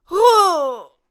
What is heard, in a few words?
Death Vocals